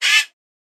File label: cawing crow